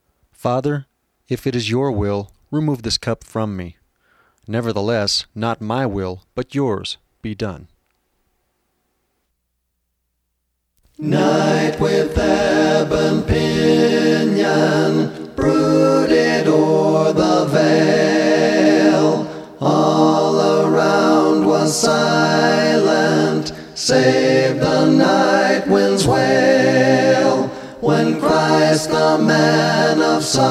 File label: music english